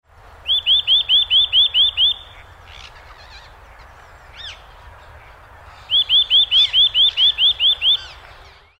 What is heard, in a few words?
nuthatch